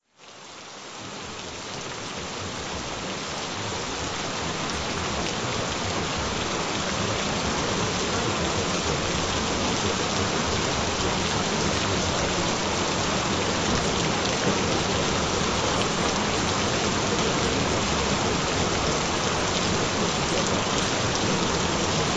Gentle Rain Sounds; Get Stunning Purple Eyes

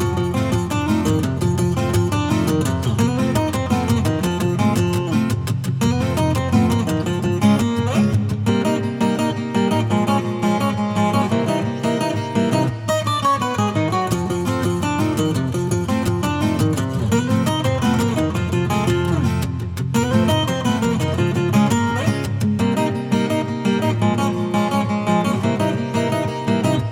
Acoustic Short

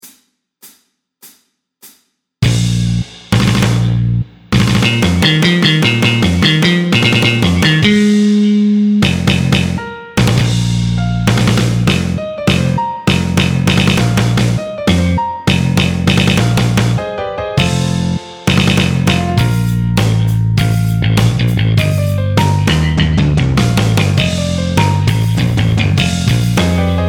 Main Theme (Backing Track); Star Wars

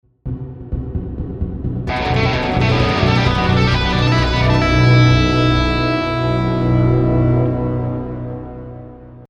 B Minor Tapping

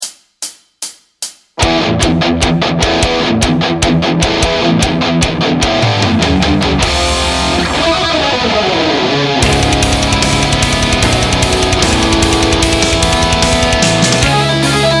Explosive Metal Technique Brutal Etude!

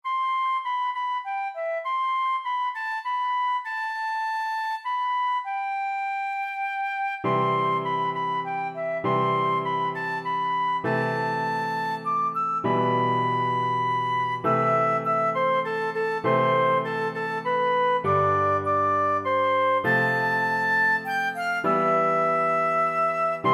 piano and flute; XmasEve2021

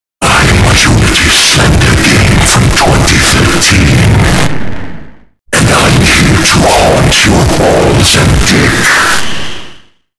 haunt sfx

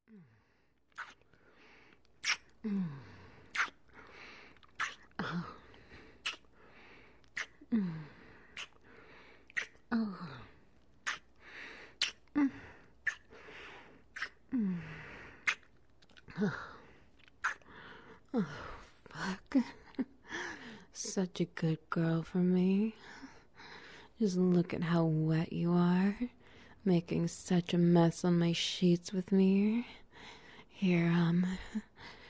Wet Noises; First Time Tribbing

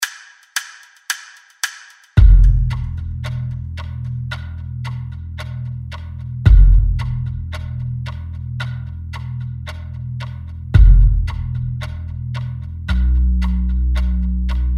Backing Track

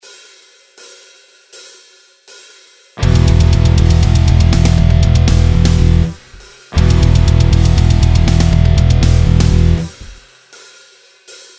Practice Backing Track; Lick 3

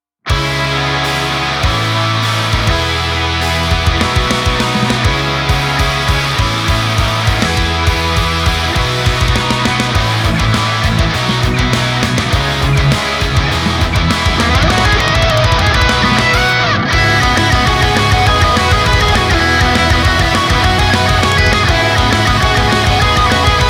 Intrumental

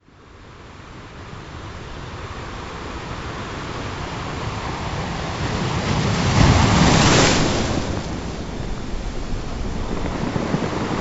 417 Hz 396 Hz 639 Hz 432 Hz Ocean

